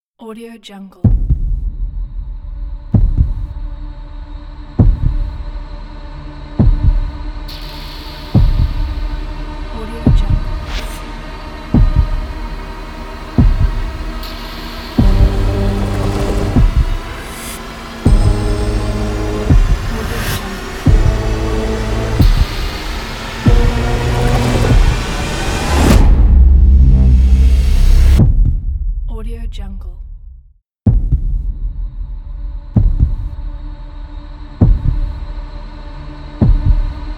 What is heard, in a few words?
heartbeat tension trailer intro ident